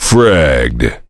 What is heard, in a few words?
heavy laughter big; tf; duke